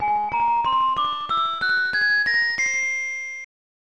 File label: Unused Jingle; bs bdash; snes